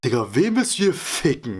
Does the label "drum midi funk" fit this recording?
No